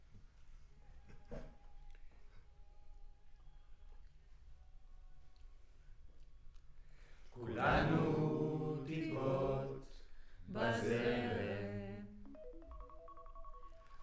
Center u47, Choir

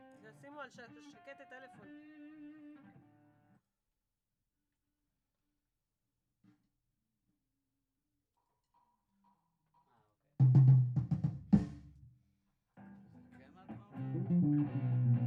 VOC GUIDE

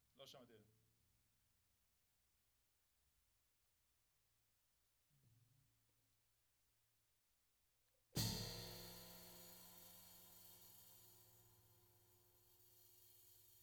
Tom

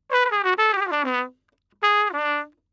Trumpet

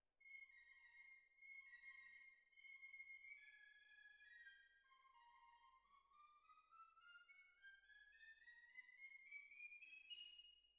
Cbasses

